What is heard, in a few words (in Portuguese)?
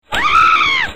gritinho; mongo e drongo